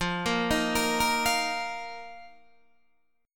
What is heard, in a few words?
F 7th Suspended 4th F, Guitar, DADGAD